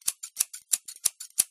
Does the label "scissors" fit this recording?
Yes